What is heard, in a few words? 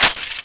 clipout, weapons; mgsm